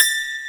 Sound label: YANG-CH'IN; AKAI S6000 CD-ROM - Volume 1